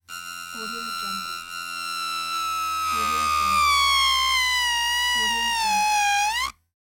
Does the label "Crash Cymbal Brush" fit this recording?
No